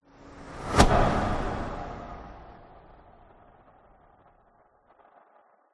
whoosh; orlando lanzini

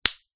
industrial metal crocodile clip release; zapsplat

